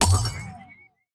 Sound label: pick up wobbly gemstone break; mobile game; esmteam